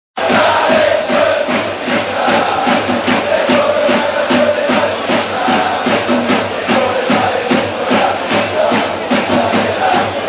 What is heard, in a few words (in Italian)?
salento musica; 1981lecce